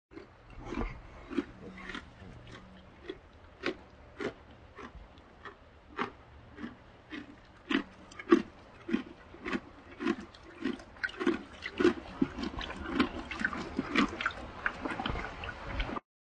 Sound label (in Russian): loshazhuet seno; лошадь ест сено; Лошадь жует траву, сено, овес, морковку